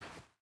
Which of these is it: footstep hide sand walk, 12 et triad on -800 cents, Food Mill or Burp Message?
footstep hide sand walk